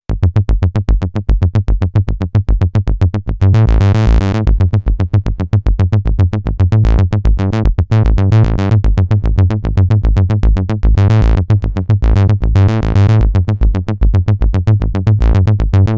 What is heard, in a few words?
korg dw-8000 sounds, arpeggiator saw sin